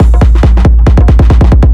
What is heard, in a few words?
drum, 138, drum-loops, Techno; Techno ID; fatboom